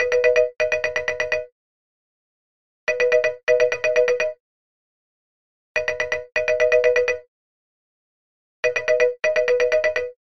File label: Remind, alarms; Tinno ATT Calypso